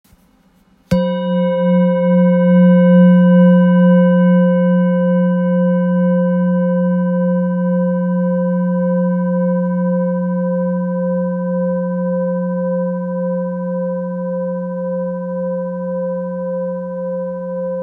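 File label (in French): Bol fullmoon fa, bols chantants tibétains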